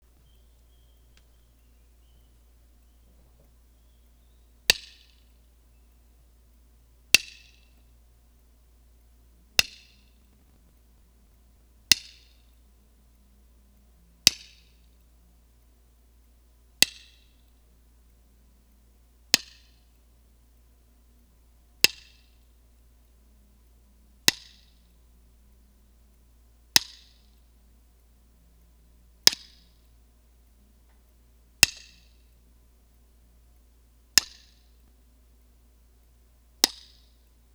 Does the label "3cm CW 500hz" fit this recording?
No